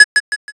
tap

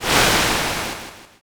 swoosh

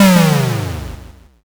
explosion l

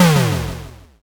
explosion s

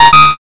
notify

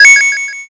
coin

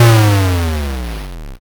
explosion l

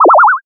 teleport

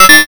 notify

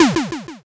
hit

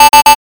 alarm

